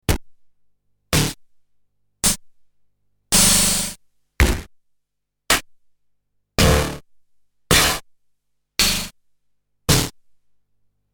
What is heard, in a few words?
Korg DDD 5 High Mod